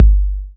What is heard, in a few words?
Bassdrums; Vengeance Samples